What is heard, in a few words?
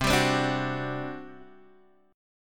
G Major 7th Suspended 4th, C